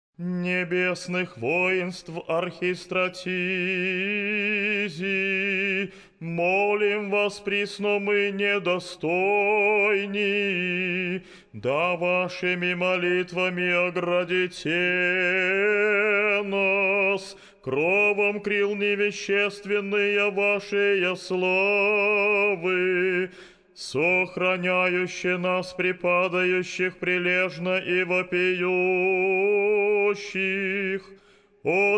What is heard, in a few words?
tropar glas 4; ponedeljnik; nebesnym chinam besplotnym; troparj